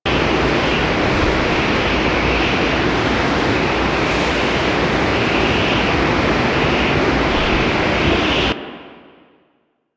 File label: Wind Loop Strong; Warframe; Corpus; Ambience